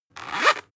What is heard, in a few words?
zipper close fast